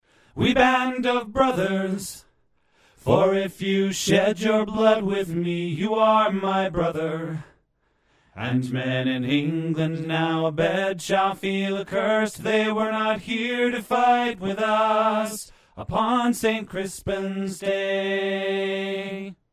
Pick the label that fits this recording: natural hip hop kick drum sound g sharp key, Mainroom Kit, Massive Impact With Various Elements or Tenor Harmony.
Tenor Harmony